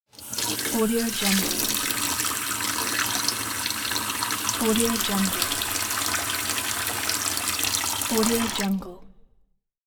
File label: water faucet